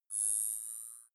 zoom in long

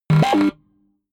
button, signale; maschinen; soundpool

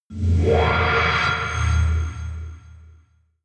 alien metallic scream dark reverb; aliens

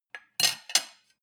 cutlery on plate place soft; berufe; gastronomie; soundpool

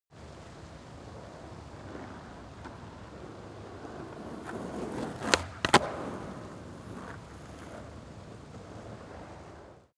skateboard single drive by jump small

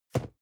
cardboard box medium place hard; objekte

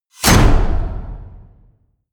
wham, whooshes

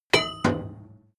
metal tool drop massive; soundpool

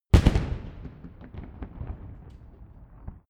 rifle salute fire; comedy-cartoon; pistolen-gewehre

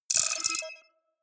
computer sound, signale; soundpool

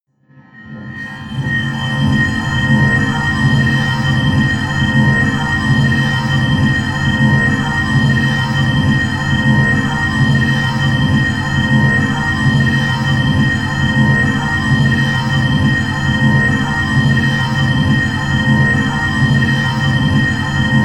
drone industry hallow high

